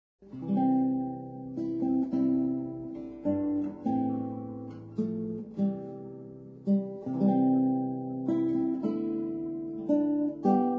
guitar acoustic; Zomboid; Lifestyle

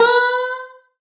Banjo Fail state; Instruments; Zomboid; Lifestyle

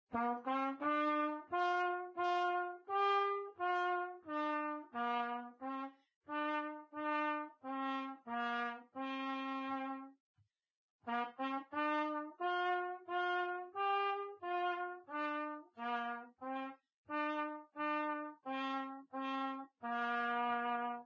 Trumpet; Zomboid; Lifestyle

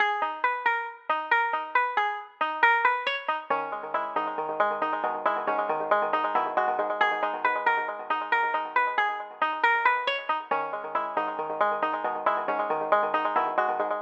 banjo; Zomboid; EntertainYourself

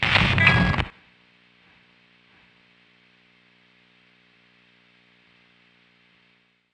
Guitar pickup; Instruments; Zomboid; Lifestyle

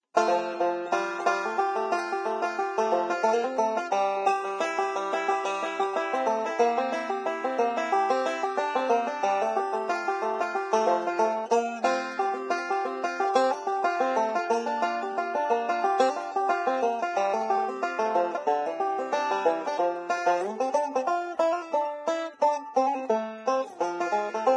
Banjo; Zomboid; Lifestyle

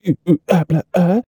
Man Dance Happy; Zomboid; Lifestyle

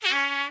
Trumpet Failstate; Instruments

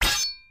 Light Blade Floor; Scrap Weapons; Zomboid

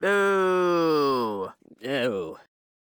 Man Booing; Zomboid; Lifestyle

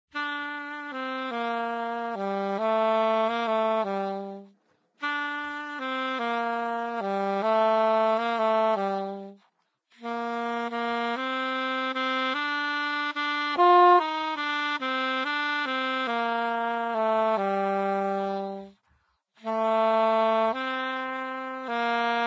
Saxophone; Zomboid; Lifestyle

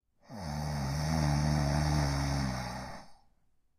snore; Zomboid

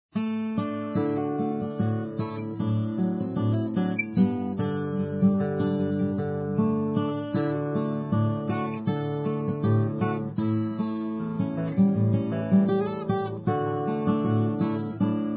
guitar acoustic; Red River Valley; Zomboid; Lifestyle

